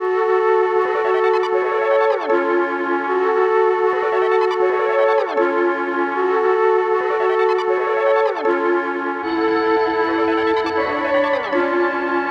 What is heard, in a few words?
melody loop flutes 156 F#m